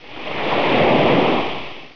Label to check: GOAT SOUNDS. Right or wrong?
Wrong